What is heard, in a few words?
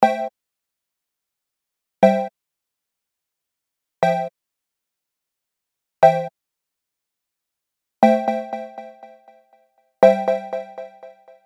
delay